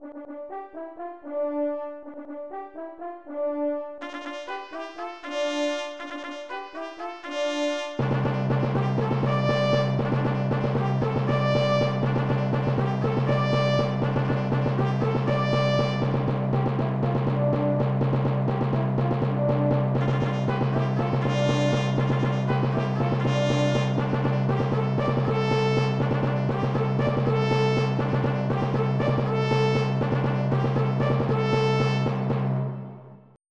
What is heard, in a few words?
Trumphet; Cronache di Britannia